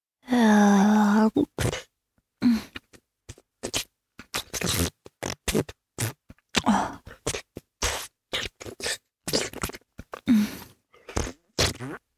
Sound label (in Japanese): チュパ音; チュパ音抜粋集; お掃除フェラ(弱):包皮の隙間に舌をこじ入れ精液を舐め取る